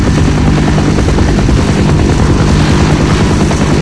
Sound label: chopper rotor, vehicles; hl2